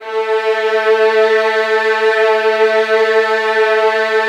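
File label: violin; Virtuoso Strings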